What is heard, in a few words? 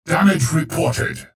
pain ability damage; low health; clutch